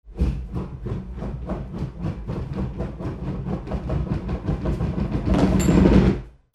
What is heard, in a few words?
FALLING